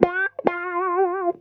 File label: GTR, Roots Disco; USB Soundscan vol.32; AKAI